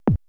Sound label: 80S DRUM SET